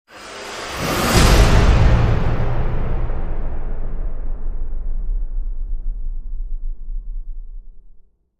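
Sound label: Hit Swoosh Transmission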